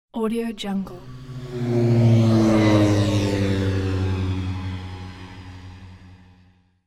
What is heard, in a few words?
fast scooter drive by mid; urban sounds